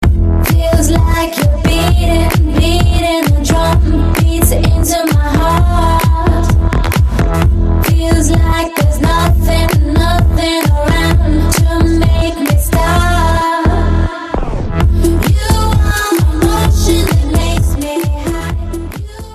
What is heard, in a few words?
Techno